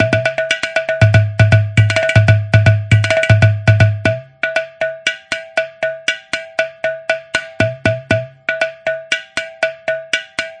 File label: ghatam, grooves